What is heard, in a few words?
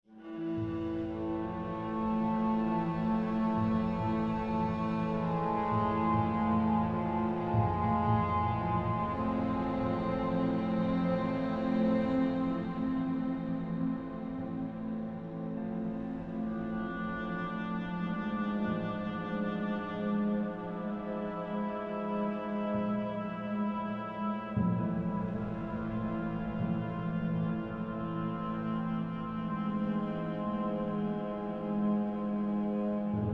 egypt expedition